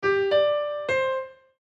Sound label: piano